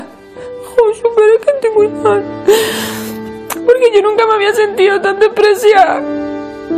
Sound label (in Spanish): llorando; gh duo